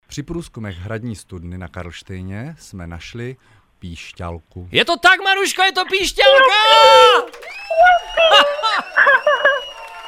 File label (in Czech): pištalka; frekvence1